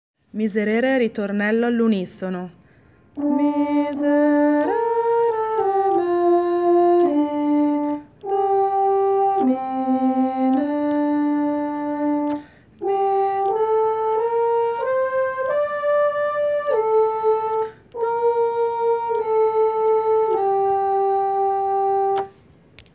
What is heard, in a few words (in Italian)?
Miserere ritornello unisono